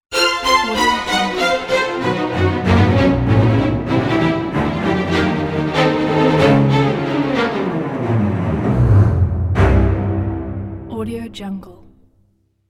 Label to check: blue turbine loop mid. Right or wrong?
Wrong